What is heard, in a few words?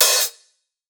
hats oneshot, Hat; Pop; Drums; Slate; SD PASADENA Pop Sample Pack